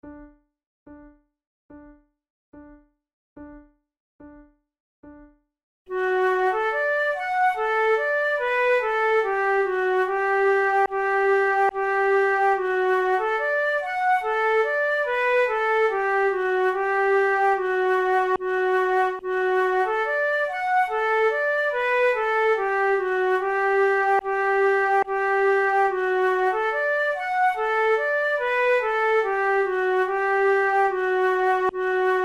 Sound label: duet, flute